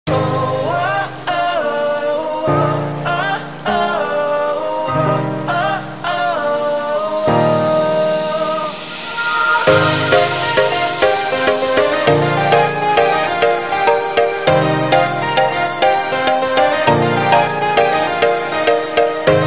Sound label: Remix